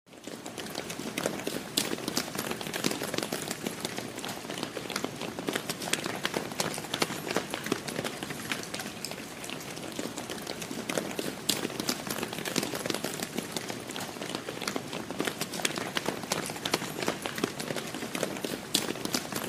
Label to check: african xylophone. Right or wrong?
Wrong